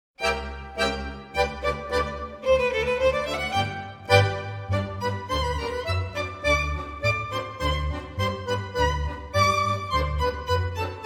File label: Russian March; The Little Humpbacked Horse